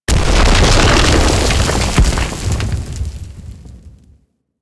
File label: Moon Bolder Large Door Crack; Ambience; Warframe; Orokin Moon